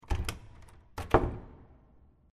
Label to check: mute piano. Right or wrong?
Wrong